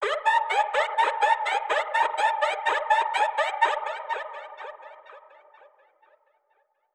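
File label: Modulation Processed, alarm siren samples 125bpm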